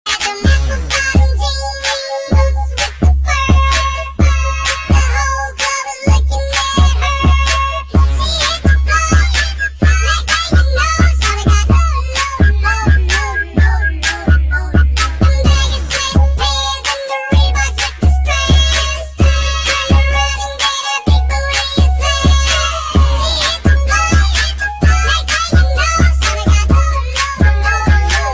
chipmunks remix

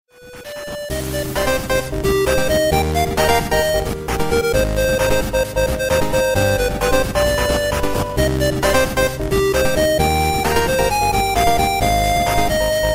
8bit; Jojo

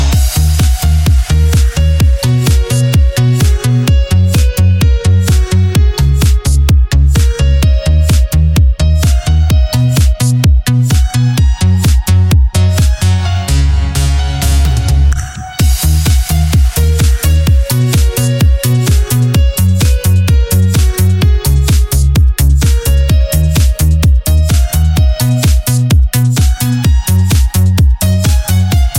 Romantic Tone

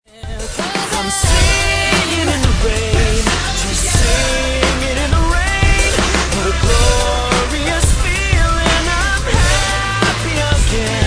singing in the rain

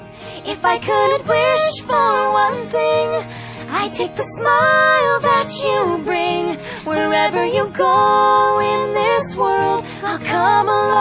two voices

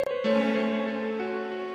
sms; Persona4

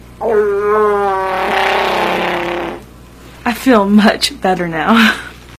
Chewbacca Fart

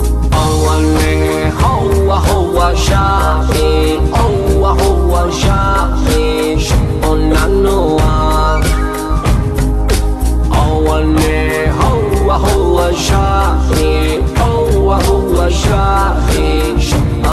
Native American

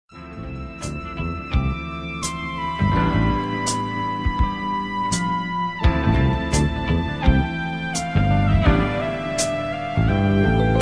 g-funk instrumental beat

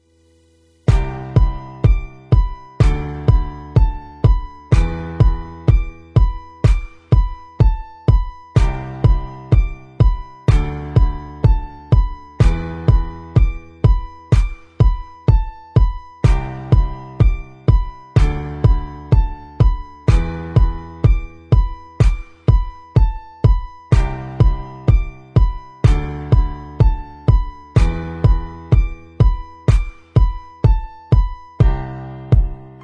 instrumental

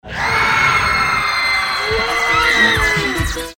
Scream

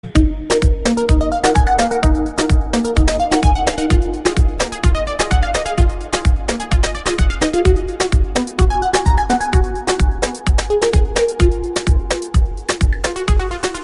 nice ringtone

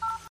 Recipie Tone

